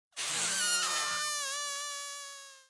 Fungus Balloon Death

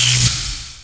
Lighting Attack

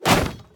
Wood Sword Hit

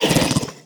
Monster Effort Big; Monster Lizzie Effort Big

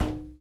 Footstep Glass; viewfinder

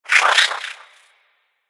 Tennis

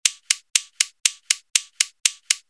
Countdown Loop; Clawee

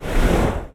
Dash

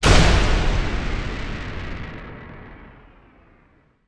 Eye Break

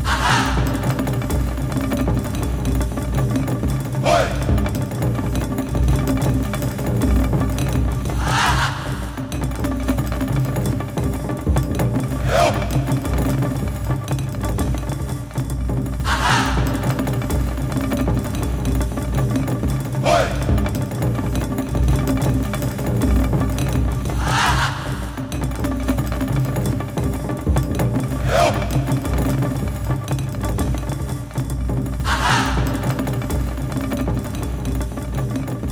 Music Loop; Infinite Hole; Music Loop 7

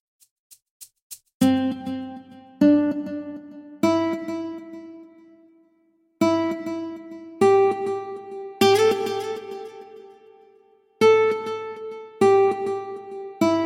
Delay Pedal; Rhythm Doctor

